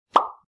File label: pop